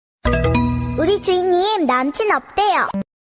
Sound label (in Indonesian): Notifikasi WA Korea Lucu